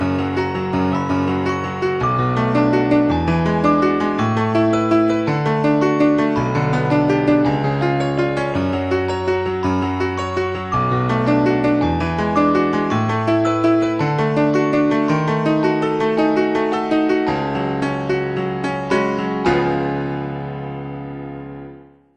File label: Piano